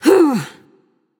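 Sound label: grunt attack, female; smite; voicepack; hera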